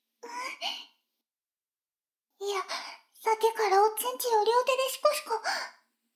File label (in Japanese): シコシコ音声; 逆時間停止されて